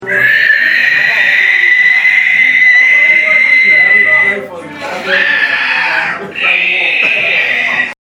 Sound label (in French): sanglier